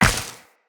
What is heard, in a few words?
Step Ice, Footsteps Walk; Characters; Tenno